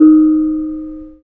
BONANG Eb4; Spectrasonics; Heart of Asia

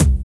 DRM BOING, Drum Machine; Roland L-CD701; Cheese menus

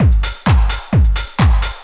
drum 130 techno; Techno Trance; DRUMLOOPS; Techno Trance Essentials

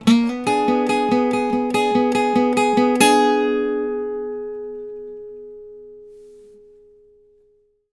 licks, Blues Guitar; ILIO; Fretworks

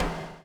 BUBBLE; Spectrasonics - Backbeat

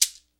PRC, AFRICAN PERC; Roland LA

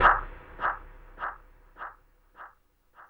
crunch; Spectrasonics; Liquid Grooves 1 & 2; ODDS + ENDS

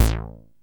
TEISCO, BASSES; Masterbits - Vintage Voltage; VV BASSES

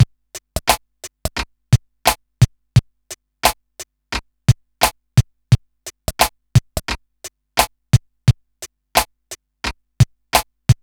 BEAT L; USB Soundscan vol.31; Miro s Grooves; AKAI

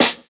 Snare, jungle breaks drum sounds

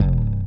Slap Slide, Bass; Bass X

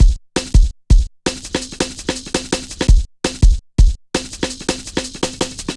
Rollin Shaker, Drum Loops 166.5

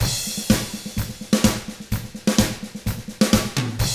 127 Drums

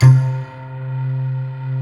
SYN GLASS; Sound & Vision - Gigapack I CD 2

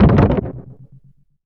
TABLA, PERCUSSN; Northstar; The Wizard VOL-1; POTION

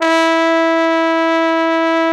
TROMBONE; Best Service Gigapack 1

